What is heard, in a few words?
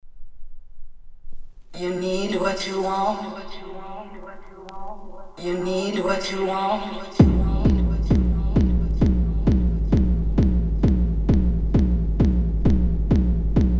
Crescendo Ritenuto